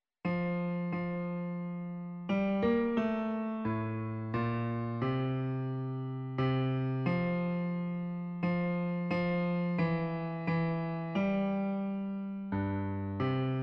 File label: Bass